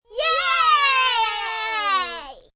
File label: Kids Cheer Yay, Cheering, small group of children; Crowd Sound Effects